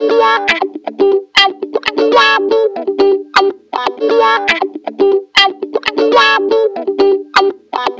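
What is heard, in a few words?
guitar, wah-wah, disco; Best Service ProSamples vol.48 - Disco Fever; AKAI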